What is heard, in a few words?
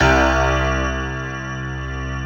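grand piano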